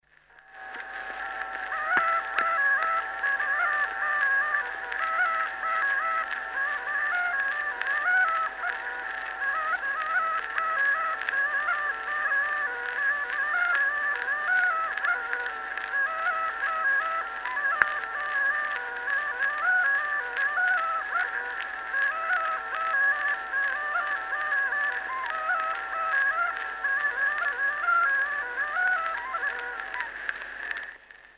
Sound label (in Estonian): Kuusalu torupill folk; Parliine; Jakob Ratsov